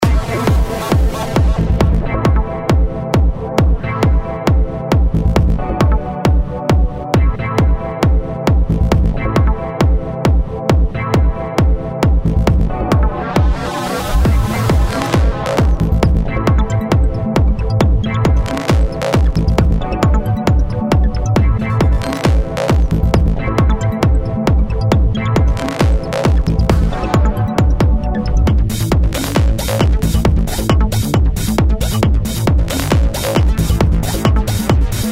synth fuel

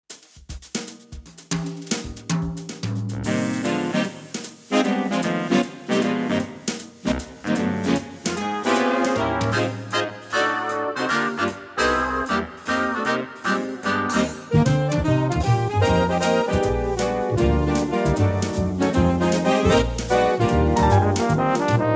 Big Band

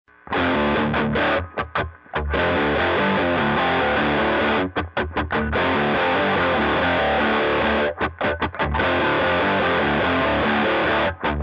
vintage rat bridge neck rock; prs

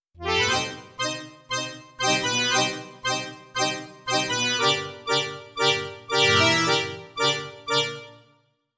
Harmonium Oct Verb

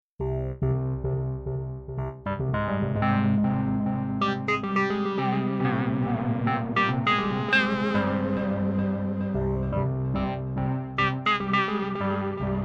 Electro Comp FX

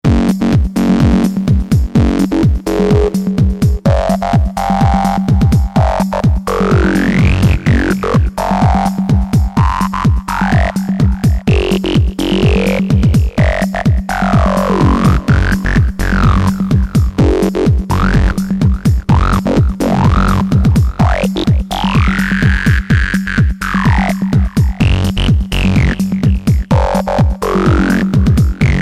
EMX Formant

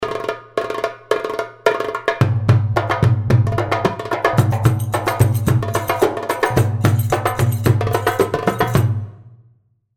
Saidi 110, darbuka

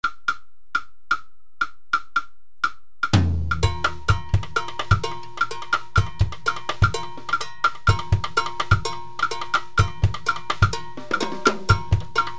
Drum Groove B

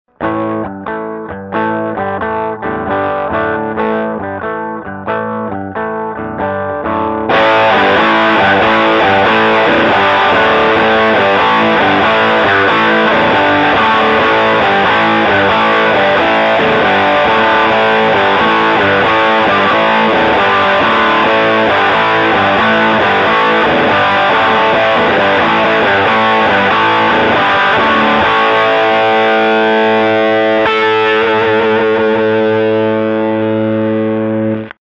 p-90s deuce tone clean boost

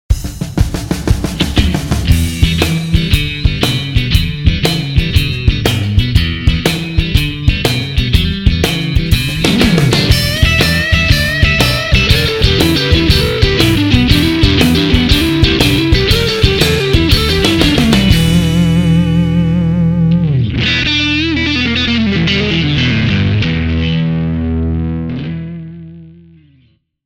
Blues

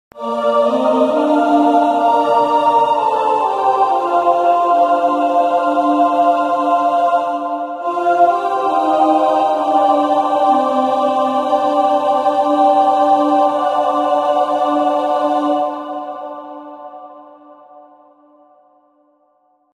Vocals Stretch

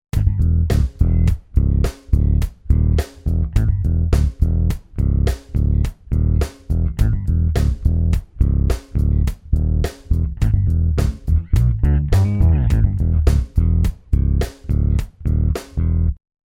Octaver

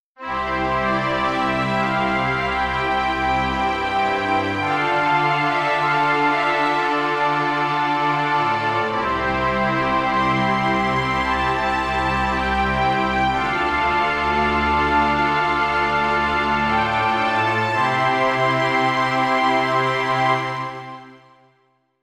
Exploration Strings

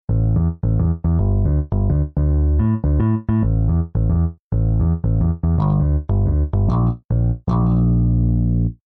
bumpin 5 string

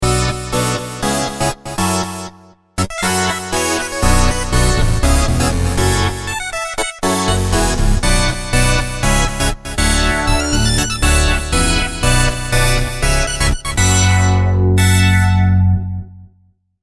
sharp keys

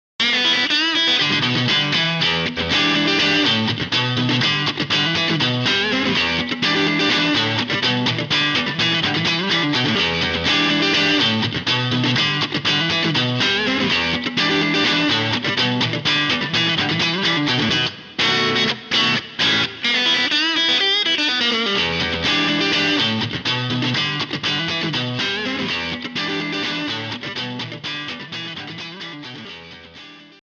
Stevie Blues Tone Lab

